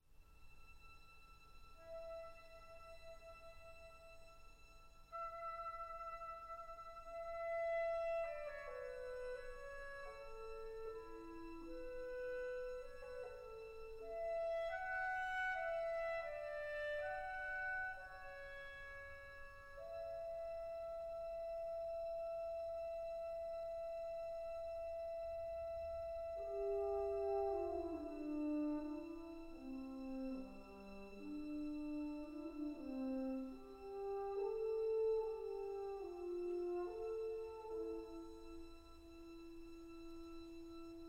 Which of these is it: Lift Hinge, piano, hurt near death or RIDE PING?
piano